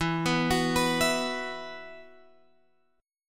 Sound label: E Suspended 2nd E, Guitar, Open F; x,11,11,13,14,11; arpeggiated; JGuitar